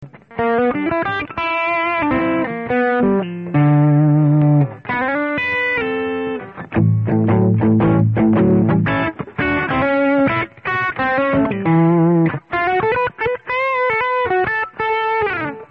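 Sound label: gibson bridge dirty, Les Paul; Bridge Pickup, DIRTY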